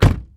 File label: close door, vehicle; CosmicRage